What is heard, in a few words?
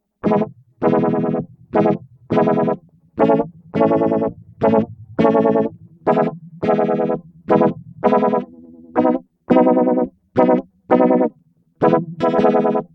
Chorus, guitars pedal effects; Roger Mayer